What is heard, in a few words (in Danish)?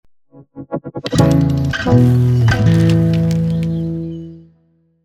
lydlogo; Hus forbi